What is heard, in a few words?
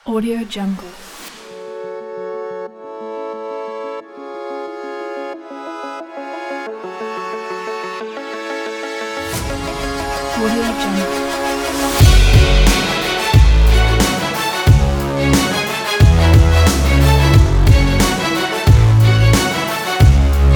inspiring hybrid trailer big beat